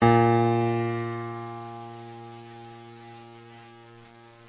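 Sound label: piano single E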